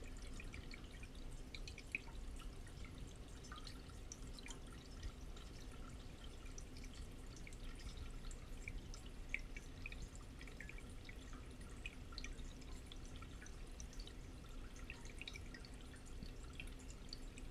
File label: Room Tone Bathroom Leaky Toilet Water Flow Pipes